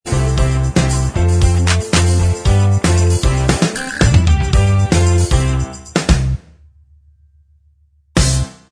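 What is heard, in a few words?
instrumental track, backing tracks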